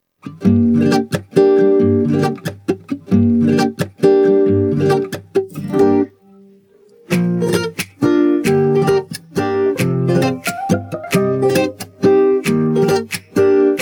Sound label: Acoustic